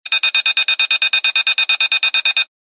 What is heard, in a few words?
buzzer electronic buzz pulse